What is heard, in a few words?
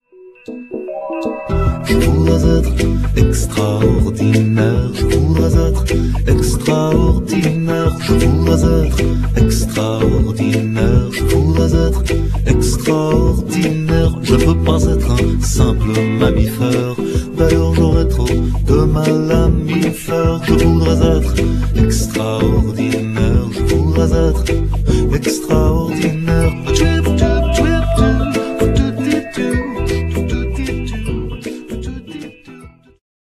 french